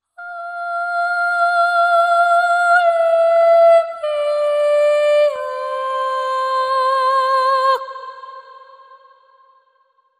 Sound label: Solo Voice